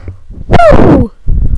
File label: woo